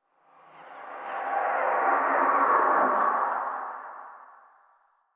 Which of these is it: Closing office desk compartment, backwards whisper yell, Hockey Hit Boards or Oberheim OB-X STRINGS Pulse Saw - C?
backwards whisper yell